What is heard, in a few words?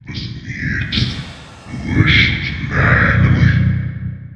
nightmare idle